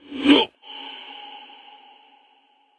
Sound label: Wound; King Terenas